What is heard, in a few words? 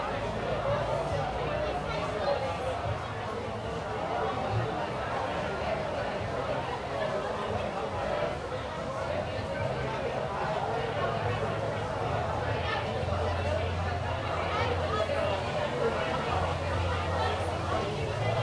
crowd; Golden Crayon Sounds